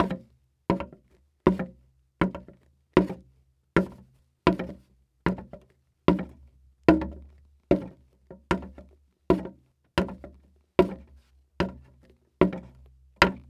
Foley Footstep Golf Cleats Walking On Wood Board Walk; Foley Footsteps